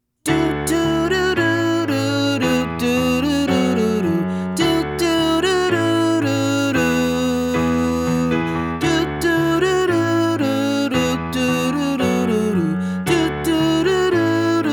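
O What a Glorious Night Soprano with Lead, christmas choir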